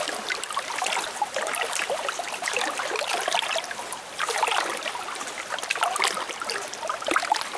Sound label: sewer; zs rendezvous